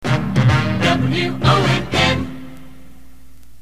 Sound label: jingle; WONN